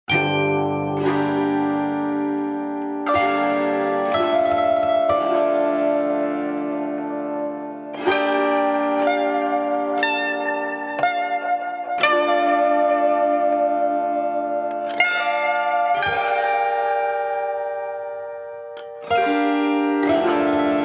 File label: slow dance, autoharp and taishogoto